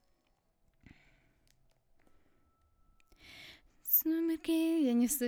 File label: vocal